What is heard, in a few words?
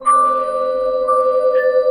More Bells; Inspired by Nature by Dillon Bastan; Emit Presets